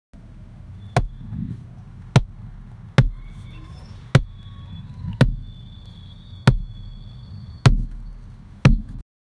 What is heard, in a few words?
bouncing basketball